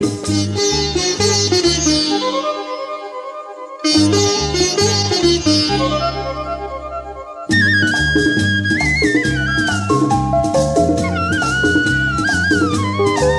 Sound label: Ring Tone